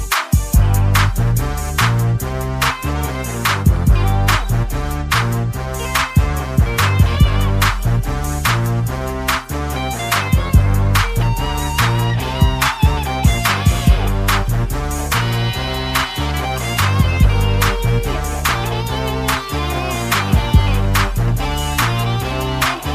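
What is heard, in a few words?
Happy Dance Instrumental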